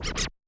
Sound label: Yamaha DJX 2B, Scratch L